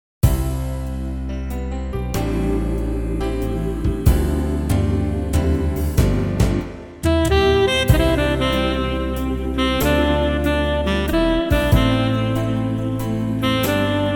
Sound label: Waltz